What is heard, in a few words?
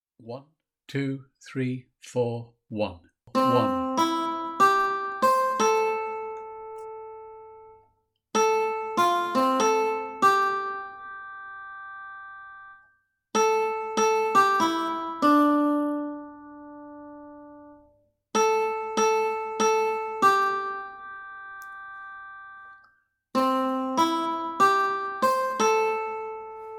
Melody practice file